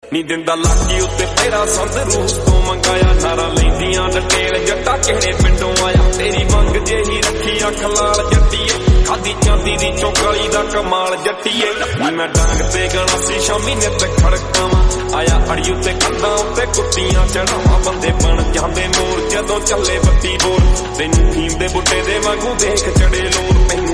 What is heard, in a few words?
Lofi Deep Punjabi Song